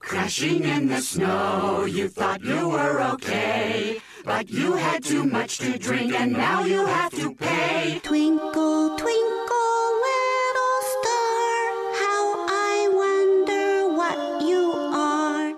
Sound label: Singing